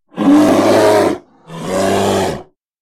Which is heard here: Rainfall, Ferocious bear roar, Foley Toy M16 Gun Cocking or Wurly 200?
Ferocious bear roar